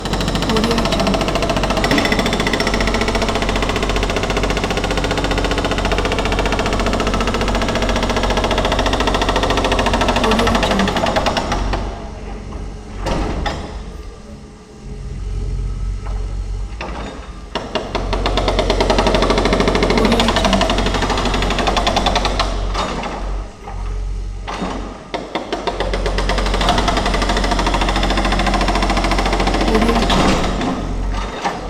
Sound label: hydraulic jackhammer; debsound